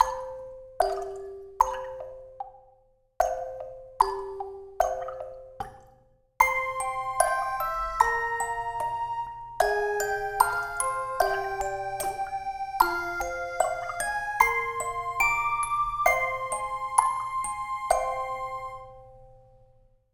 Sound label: Game Over